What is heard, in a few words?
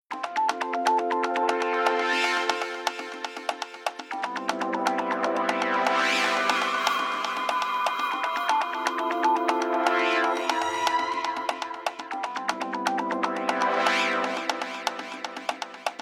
African Beats, Ringtones; Nokia; 8800 Arte